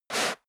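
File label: step leather shoes sand slide short, footsteps; schritte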